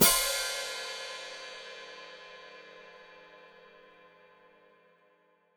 Hat Closed Open, Hi-Hat

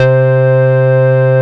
SYN Ana; Best Service Gigapack 1

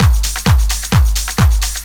drum 130 groove; Techno Trance Essentials

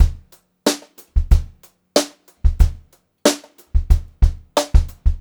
BEAT, Brushed Drum Loops; USB Soundscan vol.39; AKAI; 90 s Sample CDs

